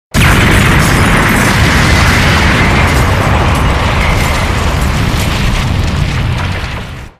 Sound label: explosion